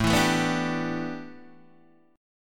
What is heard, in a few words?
A 7th Suspended 2nd Suspended 4th Guitar Open D